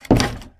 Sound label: door close; Camas